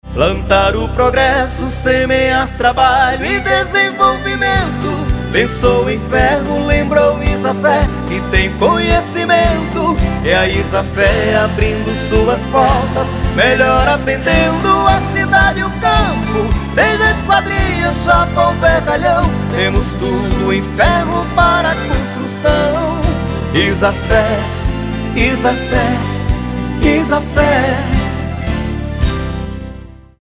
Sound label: jingle; Isafer